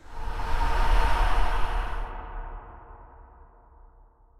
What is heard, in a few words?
Weather Transition; Phasmophobia